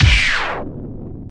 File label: Electro FX; Ueb HE Electro FX